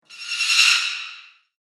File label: Slasher Horror Transition; Scary Soundscape Sound Effects; Andrew Fleming